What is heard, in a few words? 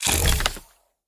skill toolbox off